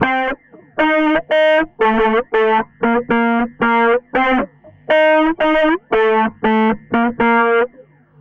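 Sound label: 117 Cm DISCO guitar, 117 BPM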